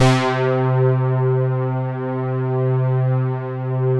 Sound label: DOOM BASS C4; Zero-G Ambient 1